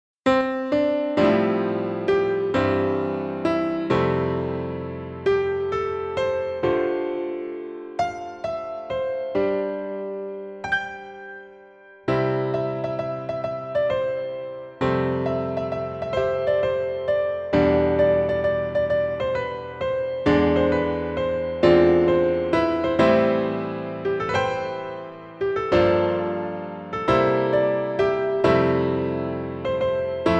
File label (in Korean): Piano Ver 멜로디MR